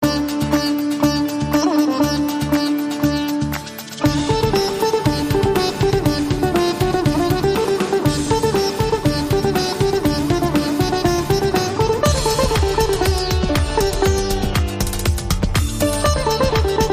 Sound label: Fusion